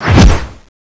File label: axe dual slash, weapons